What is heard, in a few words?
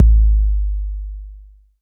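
BD808, TR-808 bass drum; TR-808 & 909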